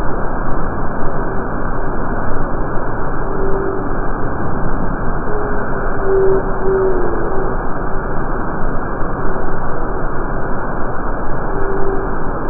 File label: Whale